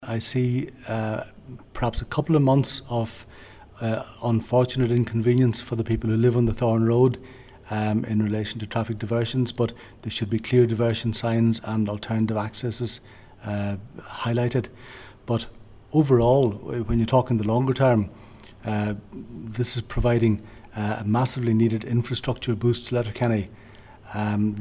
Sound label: pad